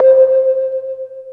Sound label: Chime; Electronic Point Blank